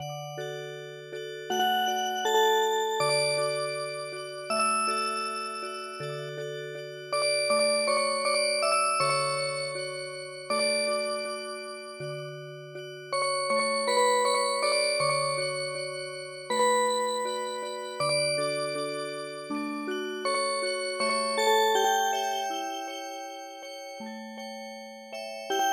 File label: Music Box